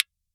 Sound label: walkman EQ; Speakers and telephones; Walkman thin